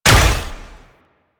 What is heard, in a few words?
Gas Arrow Explosion Hit, Weapons; Warframe; Infested; Derelict Cernos Prime